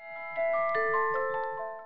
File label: glass empty trash; glass rose